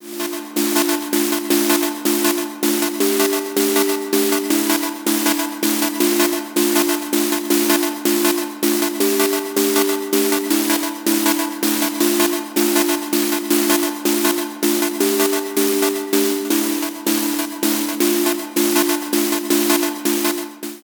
Masked Noise; Sonicware ELZ 1

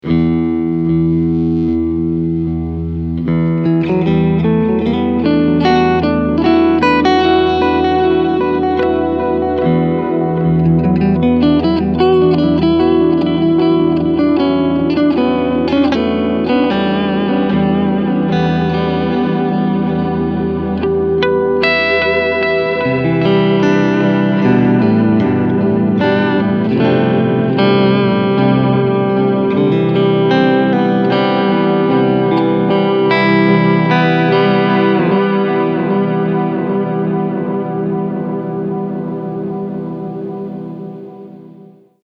Max Delaytime

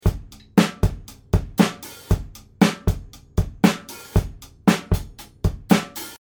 Drums Waves CLA3A Red 5 7 Gain 5

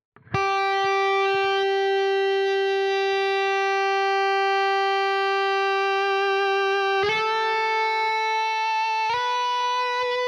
Cort MBM 2H high gain Sustainiac

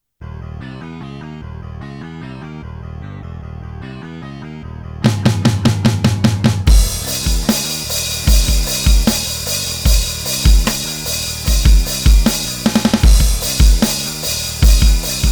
Behringer XD80USB Playalong Heavy

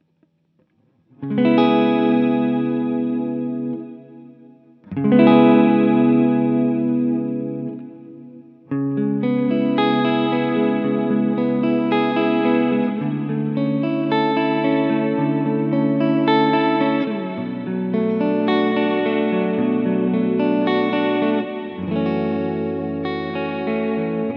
Teletronix LA 2A Ambient Sound 335; UAFX

